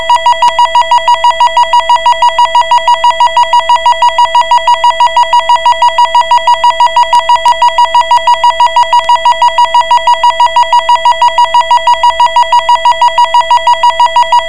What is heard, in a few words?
ambulance siren